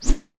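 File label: sounds of metal cable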